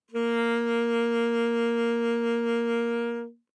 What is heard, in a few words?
Alto Sax vib ff Bb3, Eb Alto Saxophone; Woodwinds; MIS Pitches - 2014